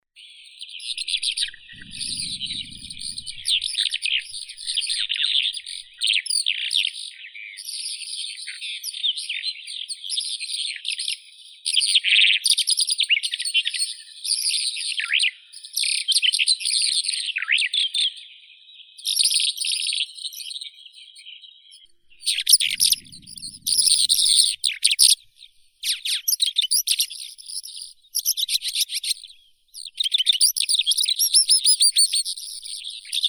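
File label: bimaculated lark, Melanocorypha bimaculata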